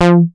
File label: Synth Sound; Vengeance Samples; VEE Synth Shots